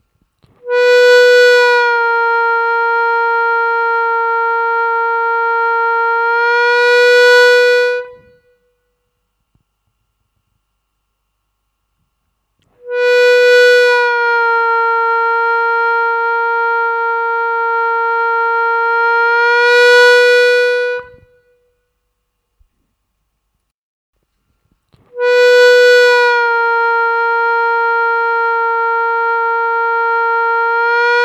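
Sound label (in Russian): плавно пауза, звуковой файл для отработки бенда -3′ (в паузах «ищите» свой бенд), губной гармошки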